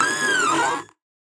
damage; DEFENCEBOT

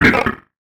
HUSK damage

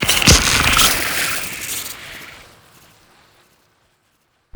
projectile

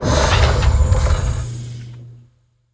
Docking Port

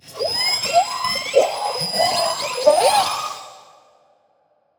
BABYMOLOCH idle

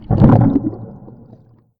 Coelanth Idle

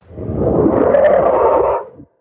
TIGER TRESHER idle

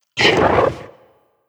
HUSKED CRAWLER damage

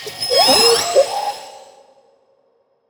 BABY MOLOCH idle

